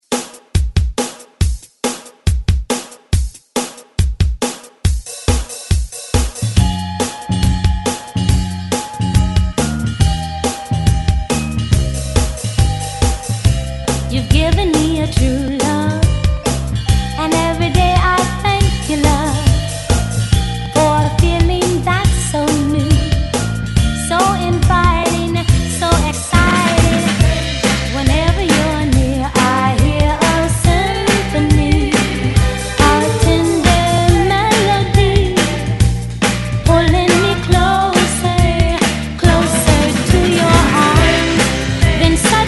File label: ReDrum 140 Bpm; DJDOLLS